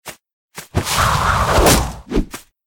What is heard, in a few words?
attack; hero